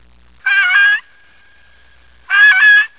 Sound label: specklebelly